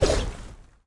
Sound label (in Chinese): ranged atk, 攻击音效; 攻击音效 atk 初级及以上形态攻击音效; 妮塔; 荒野乱斗; 妮塔会发射冲击波攻击对手